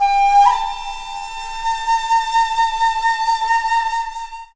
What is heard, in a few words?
ethno, sound ethno; Ueberschall; Techno Trance Essentials